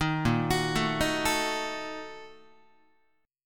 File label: A sharp Major 7th Suspended 4th Sharp 5th, Guitar; arpeggiated